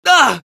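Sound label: pain sharp, Spanish; Scout; Voice file from Team Fortress 2 Spanish version.; Scout audio responses/es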